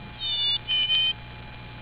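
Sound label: chickadee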